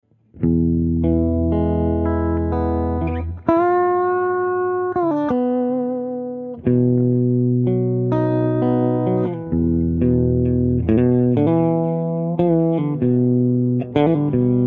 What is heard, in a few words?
Reverb